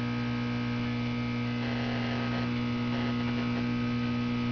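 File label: fluorescent hum; openffa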